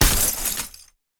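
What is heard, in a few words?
imp ice sword staff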